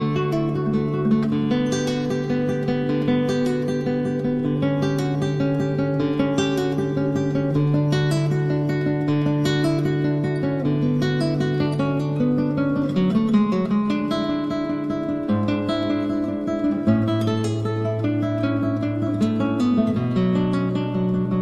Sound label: Cello Suite No. 1- Prelude